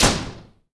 WOOD SLAM; 1000fx

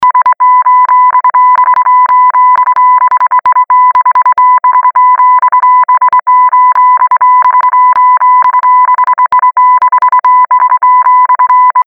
Morse Code; Best Of N2O Library

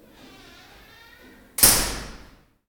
METAL SQUEEK; 1000fx

